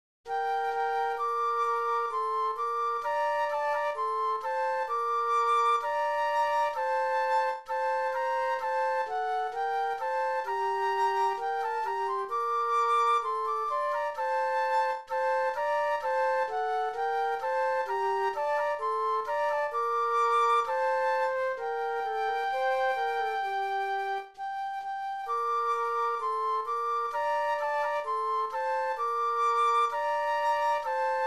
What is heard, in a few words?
Flute Duet; christmas